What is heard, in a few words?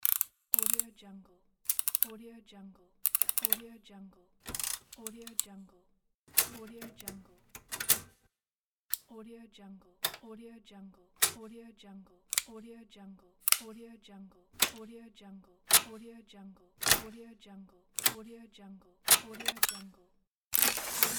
soft mechanical gear